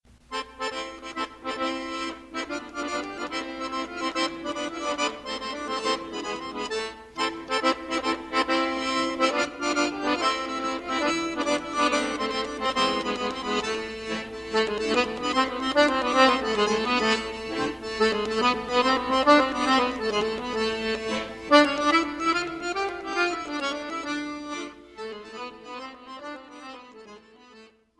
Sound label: tarantella